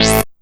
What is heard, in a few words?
Synth Hit A